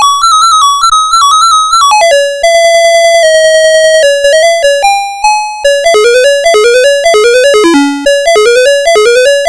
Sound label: Quari, CSG8580R5